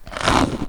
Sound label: bite; wesnoth